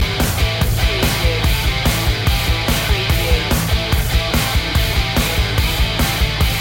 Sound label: pedal to the metal loop